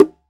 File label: QUINTO MUTE, Conga Battery; E-MU Formula 4000 Series Vol. 4; Earth Tones